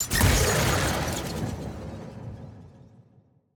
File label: perks keystone